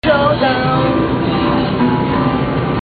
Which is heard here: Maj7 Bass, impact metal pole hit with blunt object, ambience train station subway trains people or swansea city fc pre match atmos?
Maj7 Bass